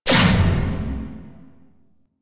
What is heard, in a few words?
Electricity Hazard Pulse; Warframe; Entrati; Vaults